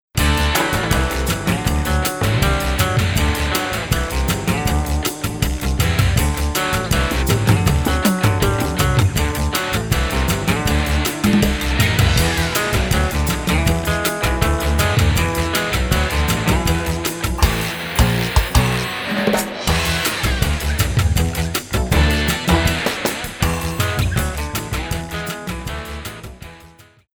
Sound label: rock guitar